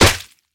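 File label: practiced killer blow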